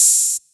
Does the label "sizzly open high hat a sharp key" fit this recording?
Yes